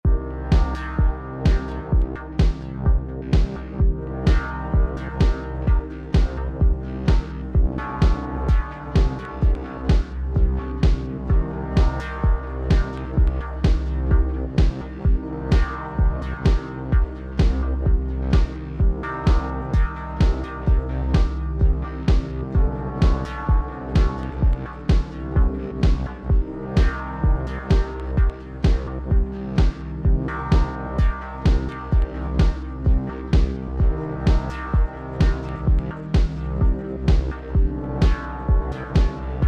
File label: slime level